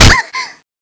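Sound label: bullet hit flesh female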